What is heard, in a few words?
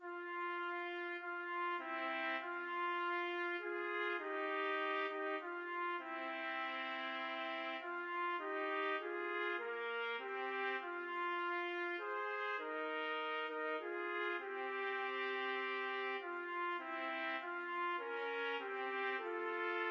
carol trumpet duet